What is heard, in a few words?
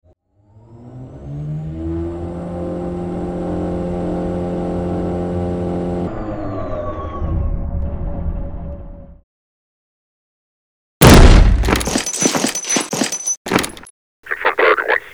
combine barge in